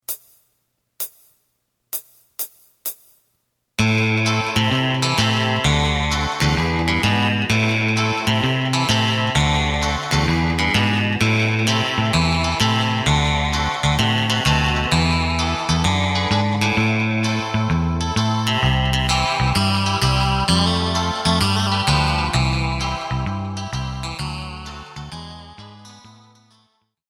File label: drum